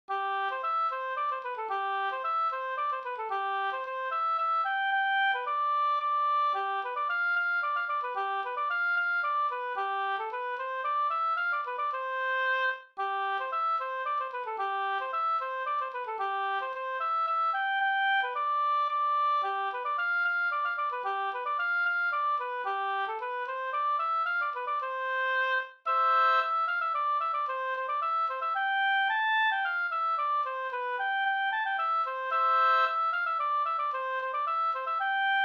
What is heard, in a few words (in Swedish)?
polska; e Smultrongardes-Ida Up nr2